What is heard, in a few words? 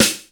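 FM snare drum; East West; Global Sounds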